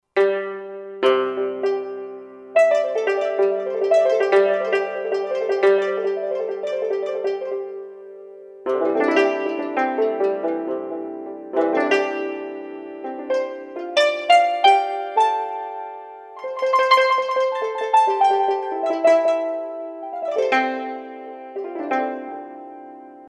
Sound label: YangQin Pick; World; Roland SR-JV80-14 World Collection Asia